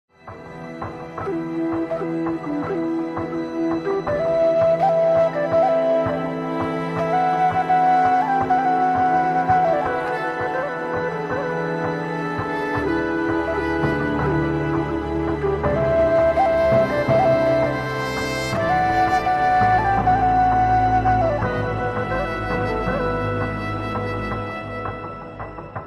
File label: flute bgm; kalki; prabas